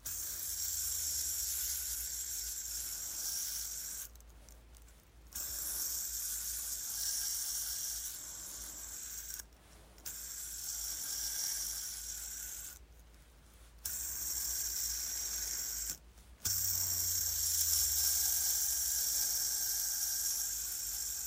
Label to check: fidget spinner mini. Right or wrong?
Right